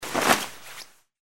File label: Move Weave; Survivor Ultimate; Tapestry